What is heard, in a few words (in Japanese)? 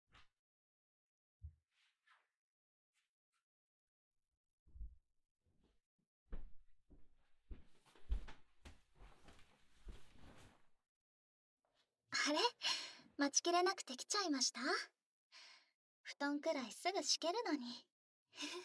耳かき; 二人の好きな耳かき